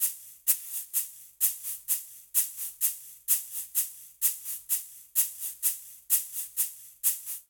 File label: Cha-cha-cha Shaker-128bpm; Latin Percussion; Ableton; Factory Packs